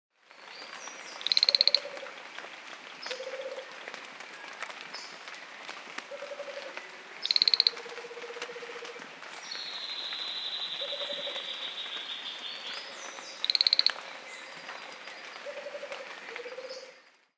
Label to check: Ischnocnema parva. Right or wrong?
Right